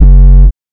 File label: dancemusic